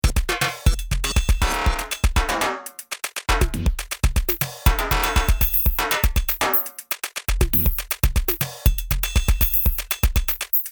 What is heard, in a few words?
machinedrum brrrt